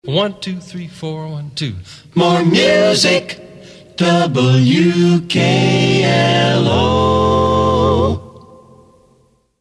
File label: Acapella Audition; WKLO